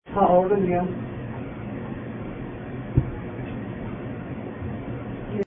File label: steil thump